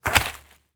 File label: Impact Brick Drop On Leaf Debris Crunch